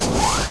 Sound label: door move; depths